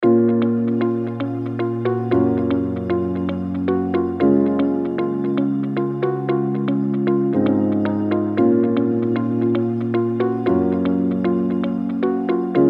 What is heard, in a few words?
inspiring